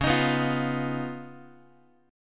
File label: C sharp 9th C sharp down Guitar, chord; C#9; strummed; JGuitar